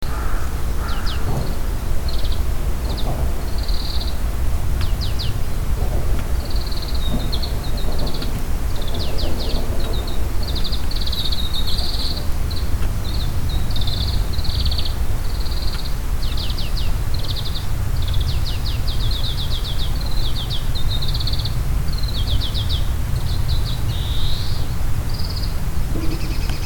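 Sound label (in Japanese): kawarahiwa, oriental greenfinch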